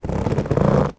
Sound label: leather chair cushion movement squeak creak; household